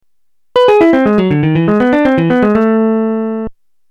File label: V I Lick, guitar